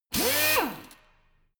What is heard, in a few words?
impactor high; maschinen; bohrer